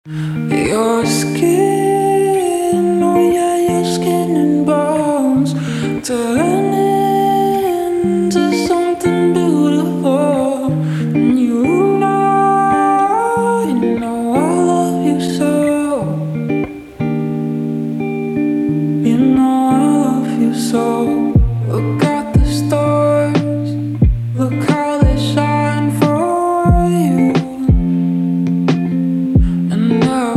lofi chill fruits music

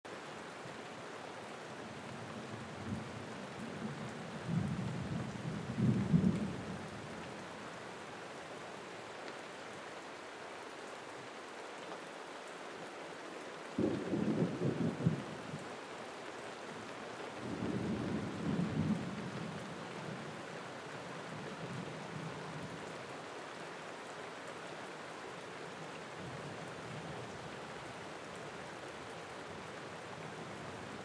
thunderstorm

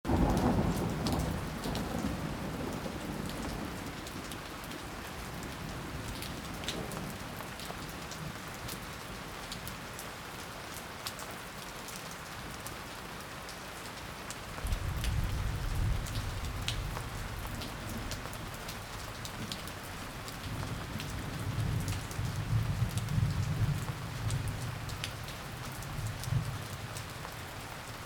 thunderstorm

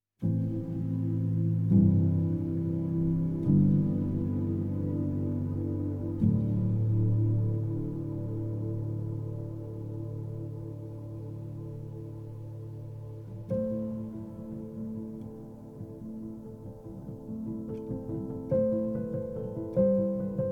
carol of the bells arr piano

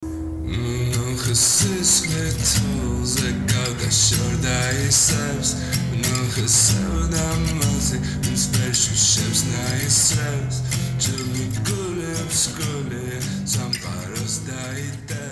war chant; georgian

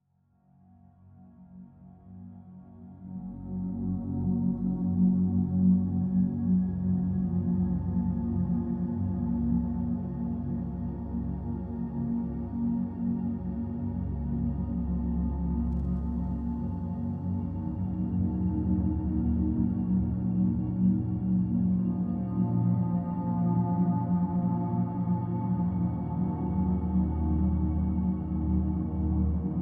binaural beats background music

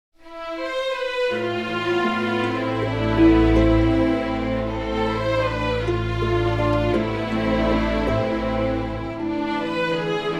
Wounded Bird Strings Version

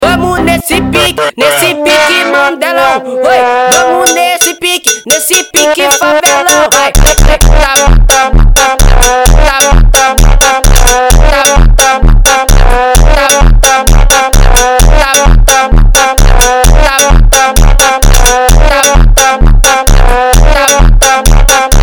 funk do sad trombone

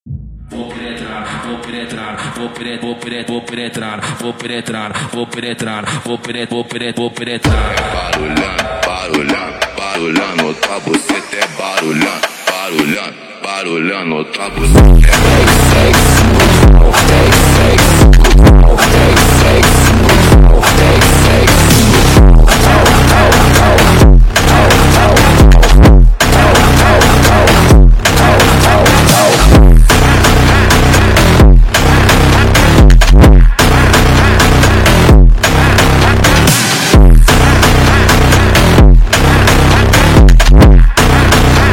brutal funk

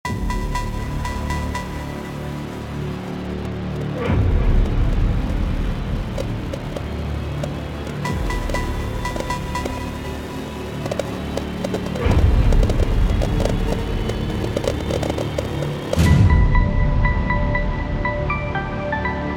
jingle bells trailerized

